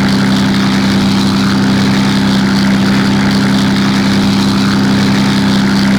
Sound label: Yak-9UM; engine; hikouon; engine sounds